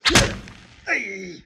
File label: smack